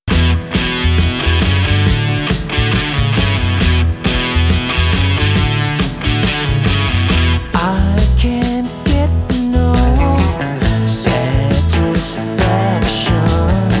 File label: Classic Rock Band